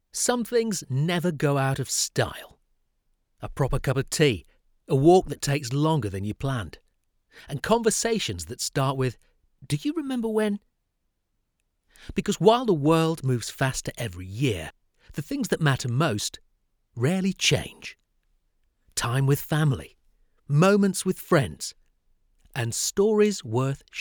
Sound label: Corporate Documentary